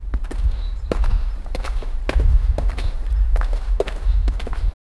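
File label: the sound of uzbek footsteps; culture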